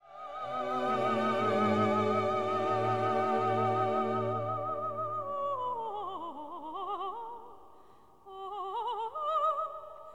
Soprano Cadenza; Benedictus

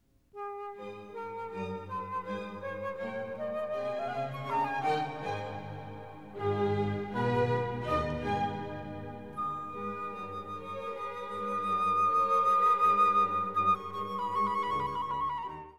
Benedictus Flute Introduction

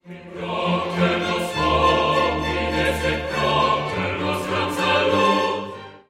Credo Qui propter

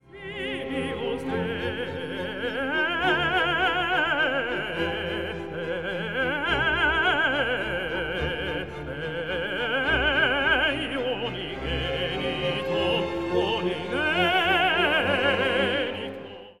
Et in unum Dominum coloratura

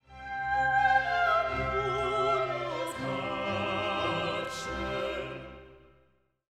Agnus Dei solo; K337 Agnus Dei

